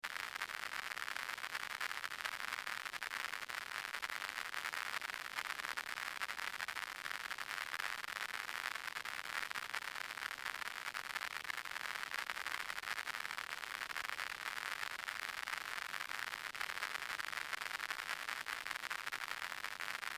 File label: horse; thidwick; stephan mathieu; gizmo